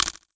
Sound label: Crunch